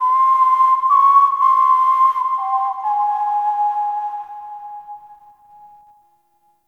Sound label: Whistle B; Ambience; Warframe; TheNewWar; Zariman